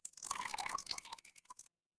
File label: water pour